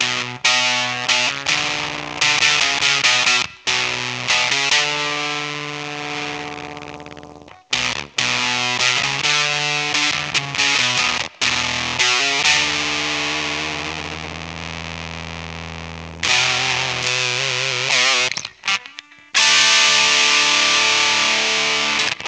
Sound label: maestro fuzz tone fz 1a